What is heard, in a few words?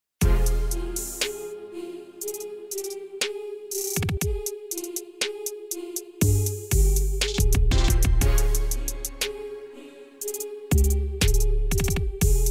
Trap Remix; Squid Games